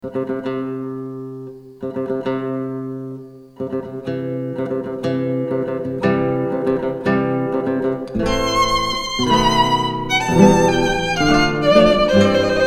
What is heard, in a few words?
wedding march guitar violin; mendelssohn